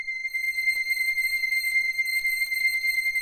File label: JV-1080 WARM SHIM